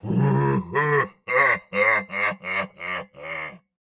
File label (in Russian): Смех; Турбосвин